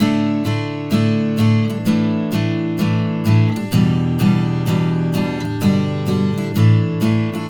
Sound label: Acoustic Latin Guitar 128BPM Dmaj; Vengeance - Essential Clubsounds Vol.4; Guitarloops